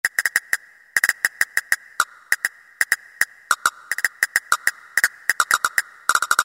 CASTANET, Roland SN-U110-02 Latin And F.X. Percussions